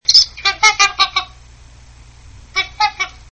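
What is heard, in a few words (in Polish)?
trębacz, łabędź trąbiący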